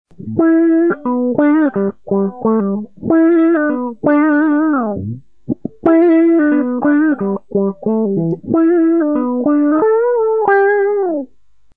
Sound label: Nurse Quacky, filters, Mad Quacker